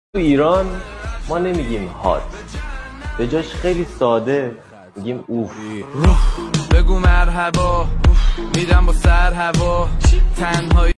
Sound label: oof remix